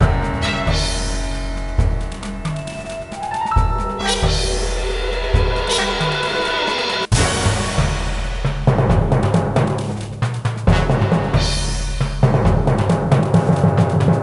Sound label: King Battle; Lego Battles 2009 NDS